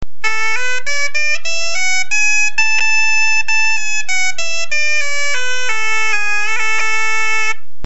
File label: bombarde